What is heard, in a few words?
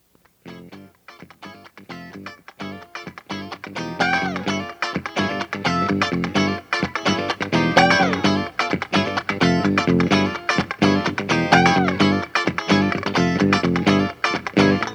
cleanfunk; RWBlues